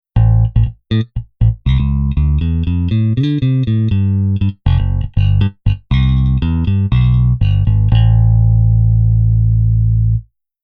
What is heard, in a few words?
Bass EQ